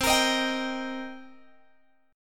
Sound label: C Minor 7th, Guitar, Open G